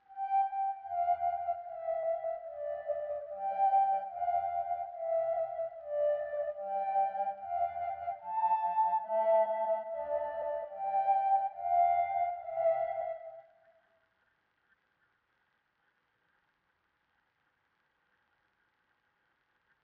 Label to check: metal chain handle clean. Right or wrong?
Wrong